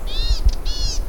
Polioptila dumicola alarm

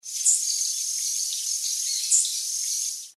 Basileuterus flaveolus lispy calls; Surubi-i

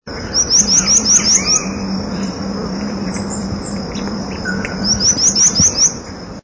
Polioptila lactea song

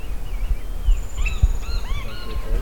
Picumnus cirrhatus

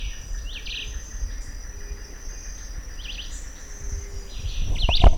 Vireo olivaceus; yacyreta

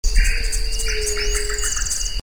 Crypturellus tataupa

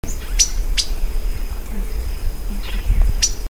Cissopis leverianus contact

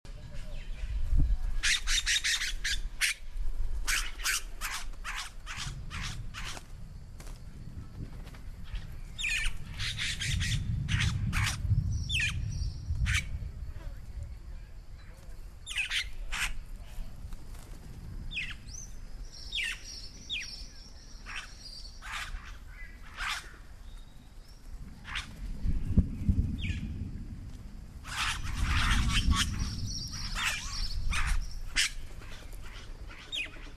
Cacicus haemorrhous colony

